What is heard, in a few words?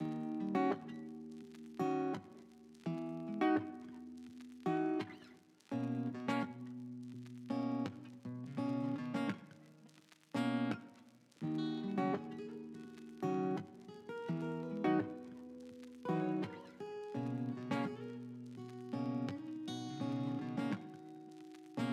acoustic BPM 84